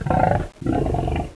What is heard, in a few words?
voltigore communicate; svencoop